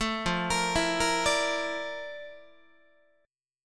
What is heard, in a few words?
F Major 13th Guitar; arpeggiated